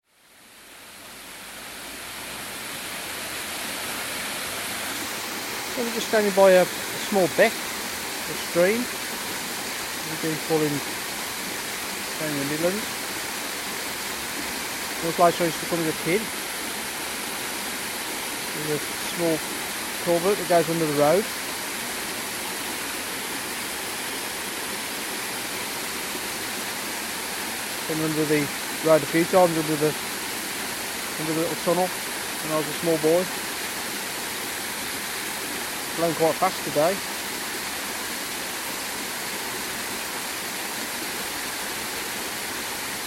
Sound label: soundscape a walk to manor park farm